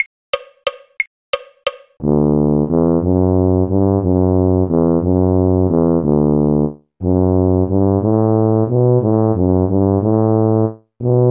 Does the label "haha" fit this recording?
No